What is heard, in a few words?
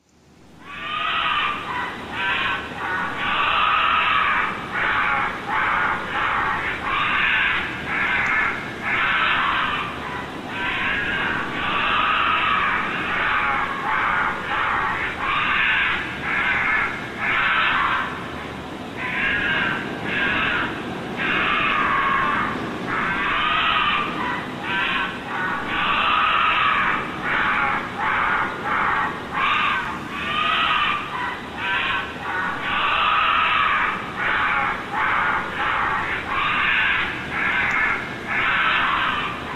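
badger; barsuk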